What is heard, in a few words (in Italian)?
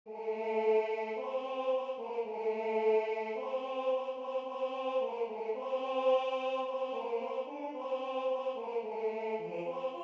Tenore; Base tenore; Cantate a Cristo (G. F. Handel)